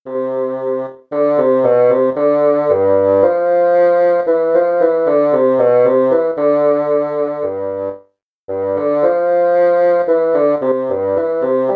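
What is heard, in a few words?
bassoon slow; middle school; Region 23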